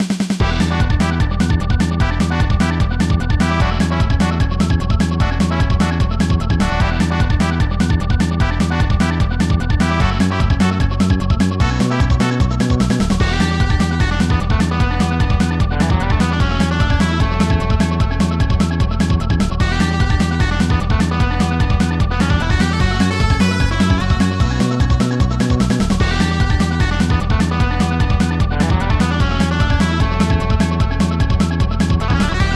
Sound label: Rival Battle Theme; sfc